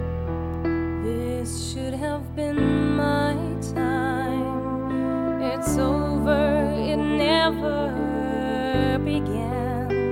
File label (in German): Gesang Ballade